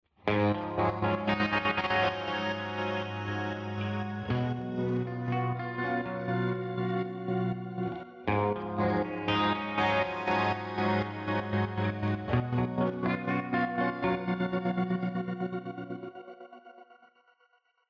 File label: StereoImager